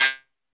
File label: PERC, 160 BPM; Ueberschall; Drum & Bass Flash Trackz